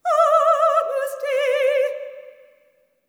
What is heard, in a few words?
SOP. TRAD, Symphony of Voices; Spectrasonics